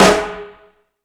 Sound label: Metal Drums, E-MU Pro-Cussion